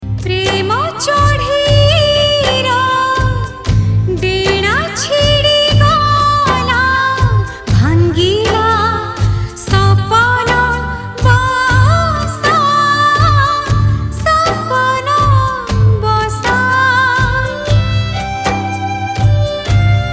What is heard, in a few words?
female version, Odia Song